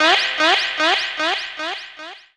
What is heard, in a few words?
Vox Hit